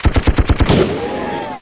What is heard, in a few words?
city